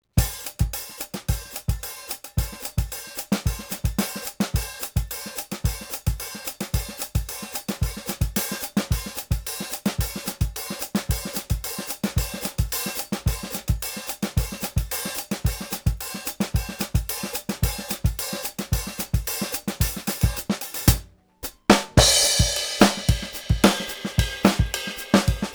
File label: latin jazz drum; MusicDelta; MDBDrumsPlusPlus